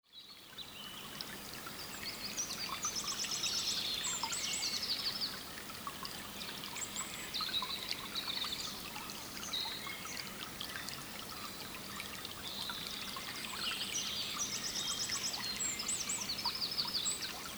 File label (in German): Eisenbach Atmo